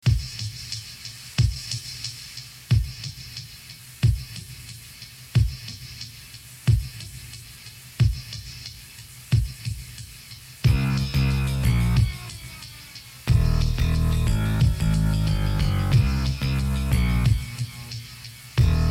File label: dub mix